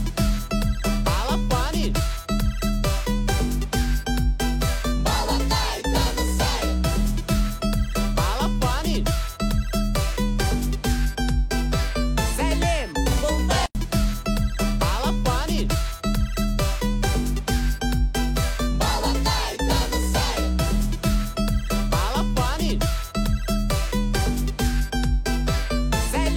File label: balaphone